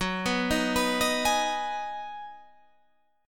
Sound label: Ab Minor 7th